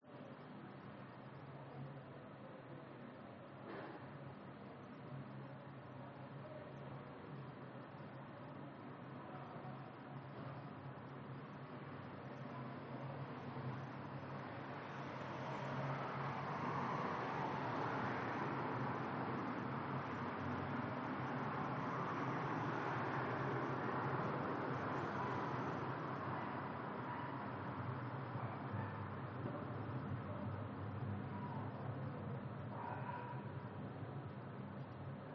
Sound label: BALCONY Evening Traffic Tram Distant Voices